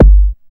BD ANALOG; Sound & Vision - Gigapack II CD 2

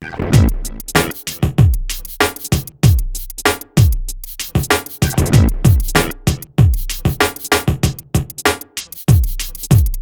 MIX, Hip Hop R n B; USB Soundscan vol.19; AKAI

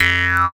Jaw Harp; musicradar; cartoon caper

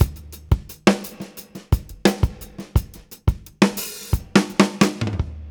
Beat Loops; Zero-G; Monster Beats

